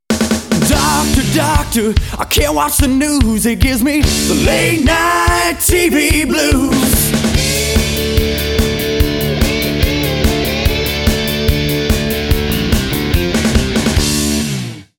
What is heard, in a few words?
jingle